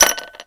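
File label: ICE IN GLASS; Greytsounds; HOUSEHOLD FX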